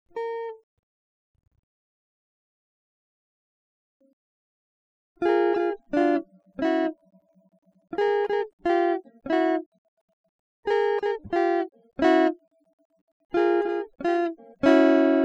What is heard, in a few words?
guitar